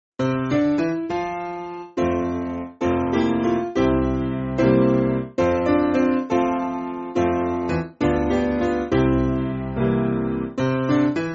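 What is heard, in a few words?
Piano, Music Backing Files for Churches; Walking In Sunlight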